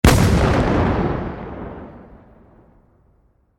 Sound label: Explosion Concussion, Blast; Warfare; Bomb Sound Effects; Explosions